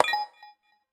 pause retry click; [EZHD] BlooMoon

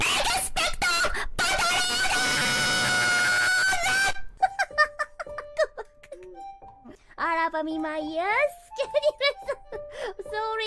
failsound; ! - 『TS - RK』 - PJS (dog circle edition)

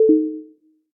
section fail

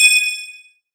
spinner bonus; saiyku [rby] instafade

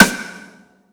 drum hit clap; hitsounds; Azer+ 2023

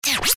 menu back; o!mania v3 (Bars)

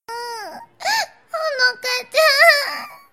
section fail; The Legendary Minalinsky Minami Kotori

